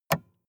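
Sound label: sports car interior switch off; verkehr transport; autos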